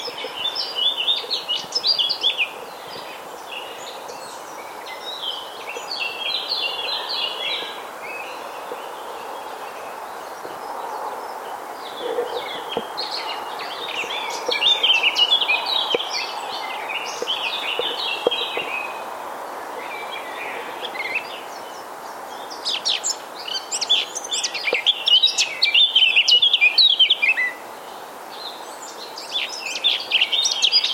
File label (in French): fauvette tete noire friche; vevard